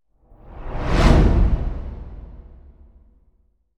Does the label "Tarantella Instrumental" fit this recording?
No